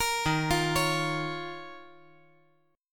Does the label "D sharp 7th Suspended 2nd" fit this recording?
Yes